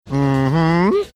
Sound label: mmm hmm